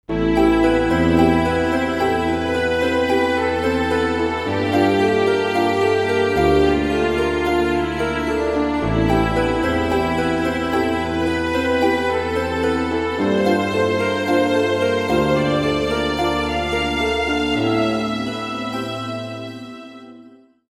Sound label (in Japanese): ゲームオーバー; Orbit; X-mas Cube